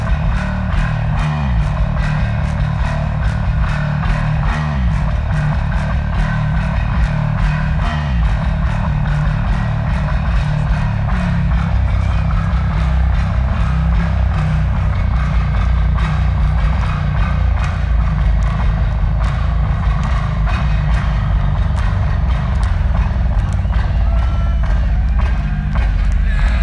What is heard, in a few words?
Bass Solo